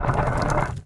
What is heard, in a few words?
foley; Ogre Magi